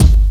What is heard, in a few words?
Kicks Loud